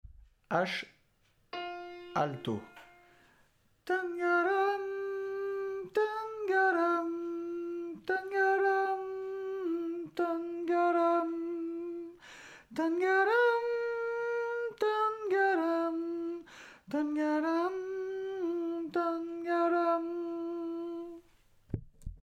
Tunggare alto

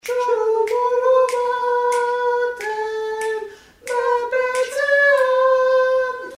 sopranes; mes 51-53

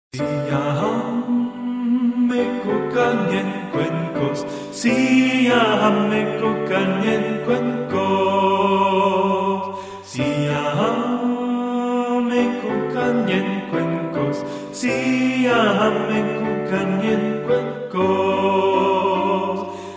Siyahamba Soprano

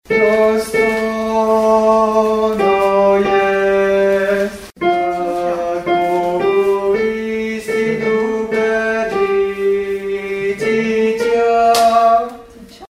dostoino iest soprani

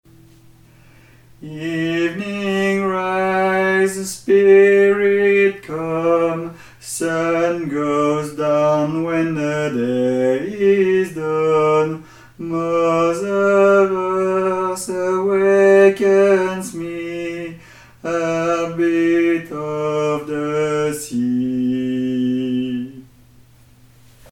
Alto; Evening rise

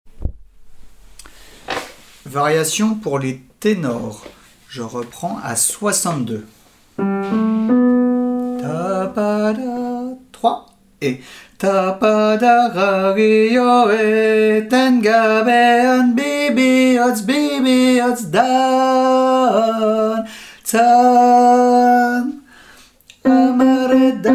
Variation tenor; Bibihotz

